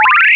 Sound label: SINE X2